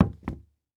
Foley Footstep Golf Cleats Single Step On Hollow Wood Surface; Foley Footsteps